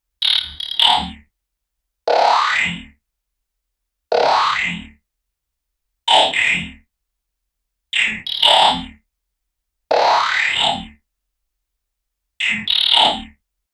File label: a monster figure robot